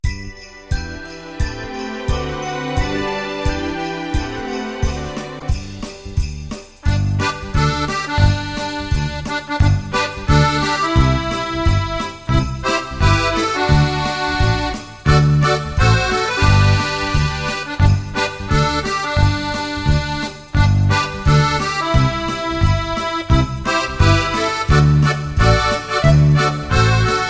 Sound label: Jingle Bells